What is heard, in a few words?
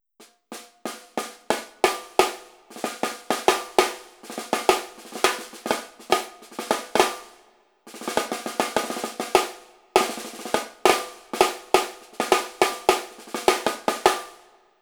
jungle snare; Sonor 10" "Jungle Snare"